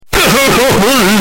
deep fried crying

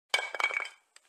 wood pipe falling

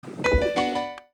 piano

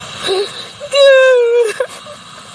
crying

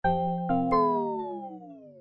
windows logon turn off

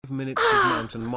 moan

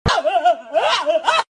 ahahahahaaaaah

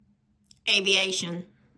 aviation

